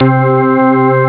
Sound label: string; Amiga Soundtracker sample